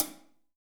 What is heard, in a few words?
HH, DRUMKIT; Best Service; Hyper Dance